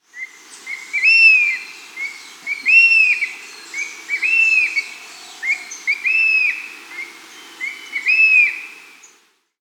Black Hawk-Eagle (Spizaetus tyrannus), Venzuela; S. America

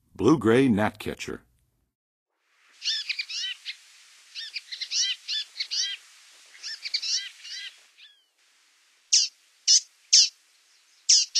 Blue-gray Gnatcatcher, Songs of Birds; North America; Western USA; Western USA Songbirds

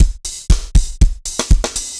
dance floor tight 120, loops

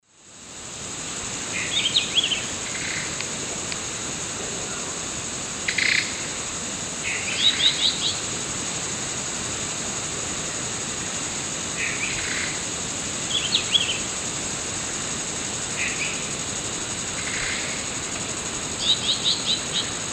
Hylophilus hypoxanthus, 2 song types; S. America; Eastern Lowlands

